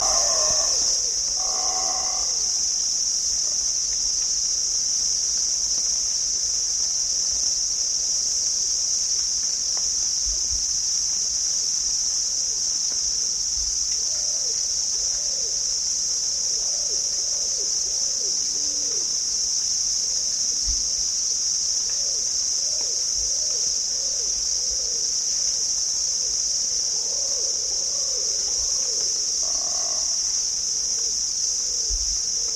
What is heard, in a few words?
Abbott's booby, پرنده; بوبی ابوت